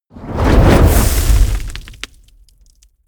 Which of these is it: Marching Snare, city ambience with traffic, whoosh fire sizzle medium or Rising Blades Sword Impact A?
whoosh fire sizzle medium